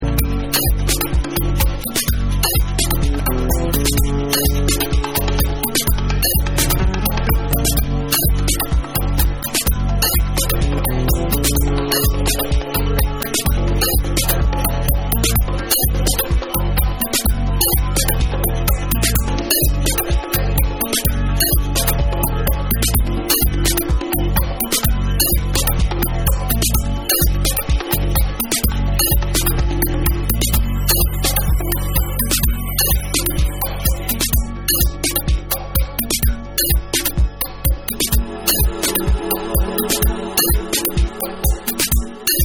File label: Guitar Mix